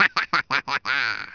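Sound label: Laugh, Afrikaans accent; Speech; International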